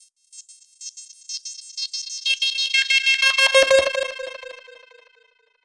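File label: Filter Processed, alarm siren samples 125bpm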